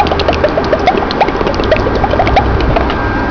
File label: CAULDRON; psion cd vol1